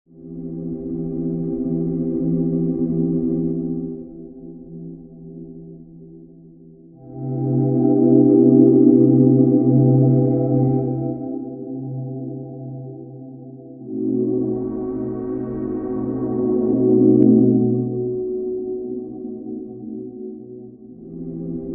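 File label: INSANE TUBE DRIVE, A beautiful sound from an old tube tape delay. We cranked up the feedback to insanity and forced self-oscillation.; CINEMATIQUE; KLANG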